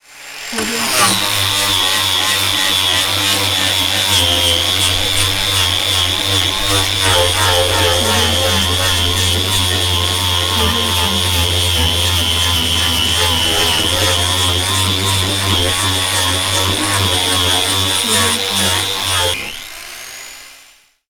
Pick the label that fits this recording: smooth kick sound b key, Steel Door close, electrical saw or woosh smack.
electrical saw